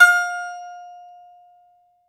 BAGLAMA F0LR; E-MU Producer Series Vol. 7 – Old World Instruments

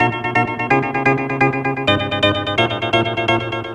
Head Keys; M8

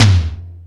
Toms, E-MU Pro-Cussion

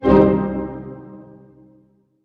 HHIT C MIN, HARP HITS; Sound On Sound - Peter Siedleczek's Orchestra

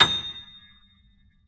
Keyboards Acoustic, GRAND P; USB Soundscan vol.17

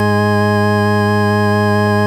VOX G#2, VOX CONT ORGAN; Sonic Reality; Vintage Timetraveler

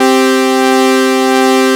DANCY 1 BASS C4; Best Service Dream Experience

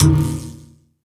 PERC, Drum & Bass, 170 BPM; Ueberschall; Drum & Bass Flash Trackz

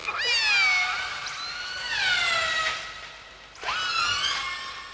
ANIML; Zero G; Man Machine

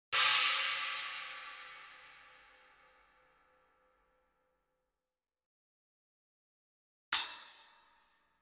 china + charleston stick; Sound Sampling Collection VOL-5; Drums & Bass Fusion Funk Effect

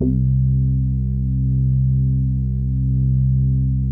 BIT BASS C1, CRUMAR BIT01, Vintage Keyboards; Big Fish Audio - First Call Vintage Keyboards

